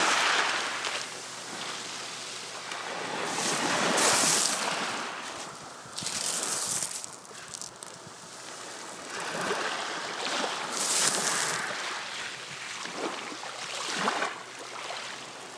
the sound of andalusian sea waves

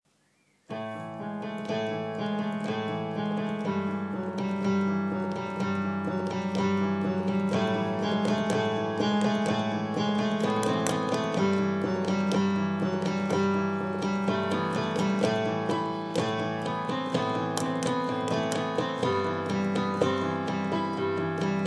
one small improvisation

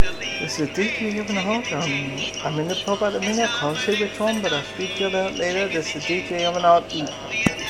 pub

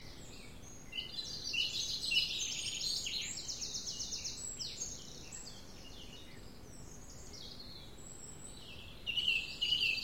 dawn birdsong, west sussex; midhurst

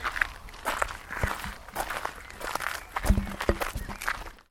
scrunch

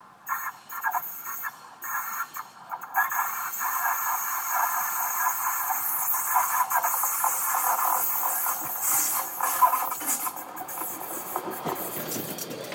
tram noise; highbury vale

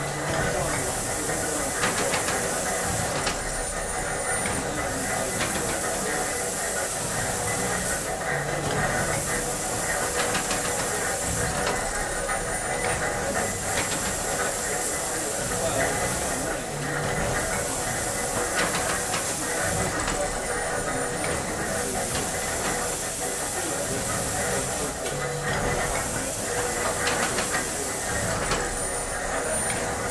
Crossness basement

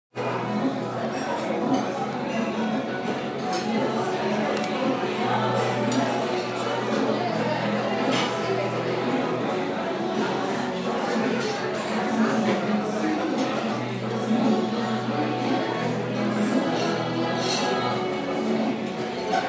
music live over a light brazilian bite; from my honeymoon

